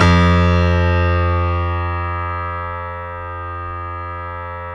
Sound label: StereoGrand, Keyboards